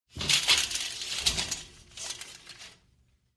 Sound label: Venetian blind; SOUND EFFECTS1